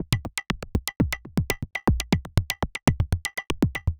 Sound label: Click Trick, Modular Electronica; Sonic Foundry (Sony Creative Software)